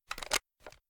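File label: weapon foley assault rifle reload fast empty dmr shake; weapons; gmod; viper; scharlie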